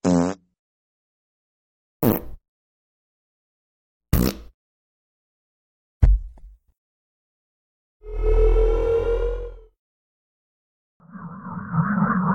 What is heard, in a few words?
space sfx